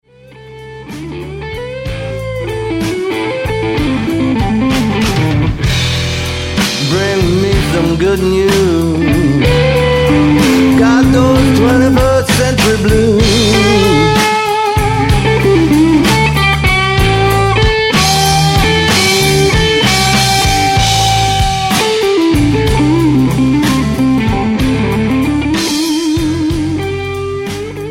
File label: BLUES